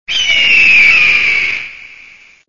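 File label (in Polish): orzeł przedni, głosy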